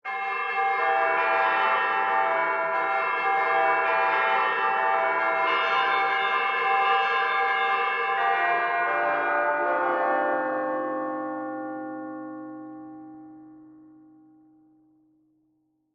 Bell Puzzle Bells Melody Ring Ring Roses; Silent Hill Downpour

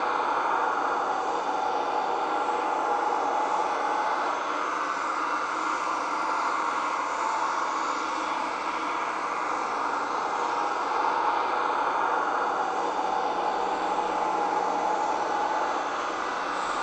Diner Amb OtherWorld Breath Loop; horror and atmosphere fx; Silent Hill Downpour

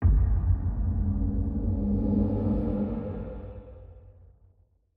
Bridge Fall And Swing Loop; horror and atmosphere fx; Silent Hill Downpour

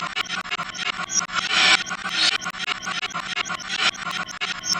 Metal Weird Loop; horror and atmosphere fx; Silent Hill Downpour

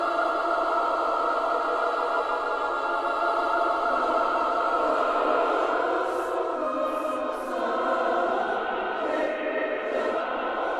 Cluster Choir Loop; Silent Hill Downpour

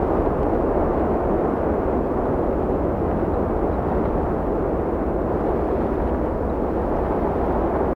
Wind Canyon Distant Loop; weather fx; Silent Hill Downpour

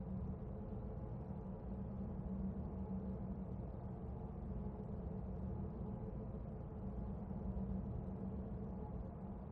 Radiostation Dark Moody Atmosphere Loop; horror and atmosphere fx; Silent Hill Downpour

